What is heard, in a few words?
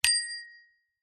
transport bicycle bell single ping